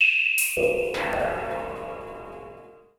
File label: PERCUSSN, AMBNT, 160; Ambient; Drum